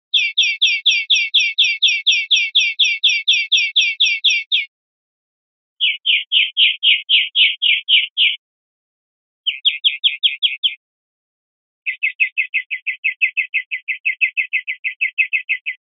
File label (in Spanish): golpes corridos yioyio, verderon